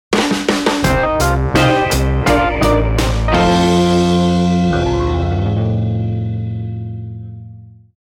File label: Indie Rock Intro